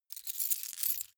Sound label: key ring large rattle; objekte; schluessel